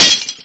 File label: lightbulb break; HeavenStudio; karateman